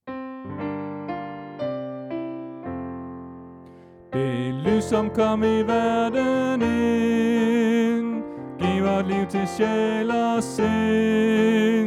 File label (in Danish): Sopran